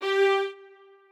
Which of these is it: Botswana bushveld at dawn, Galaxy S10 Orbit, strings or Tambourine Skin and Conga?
strings